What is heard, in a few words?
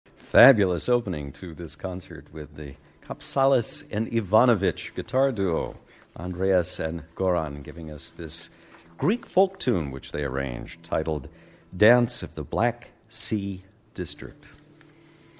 Guitar Duo; Mayne Stage Chicago IL 2011